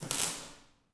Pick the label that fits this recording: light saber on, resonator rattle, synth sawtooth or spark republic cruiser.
spark republic cruiser